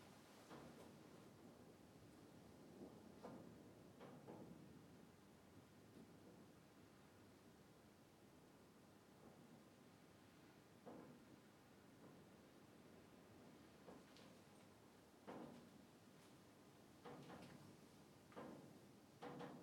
Ambience, Empty Garage Hum, Metal Gate Hitting, Wind Outside, Scary; AmbiX; Sonik Sound Library; Spatial Roomtones & Interiors